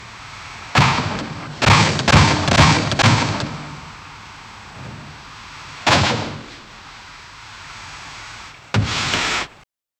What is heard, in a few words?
a sharp loud rubber poppi; flop